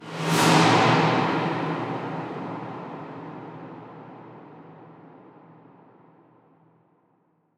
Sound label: Deep Swoosh